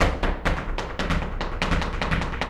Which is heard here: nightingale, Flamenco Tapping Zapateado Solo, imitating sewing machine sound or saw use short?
Flamenco Tapping Zapateado Solo